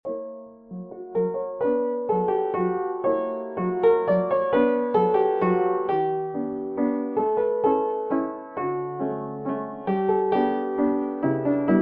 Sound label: Waltz; Viktor Kosenko; n6 Op25